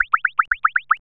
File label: walk; VIPER